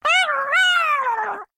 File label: murloc; silverhand